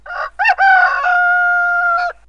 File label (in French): Chant du coq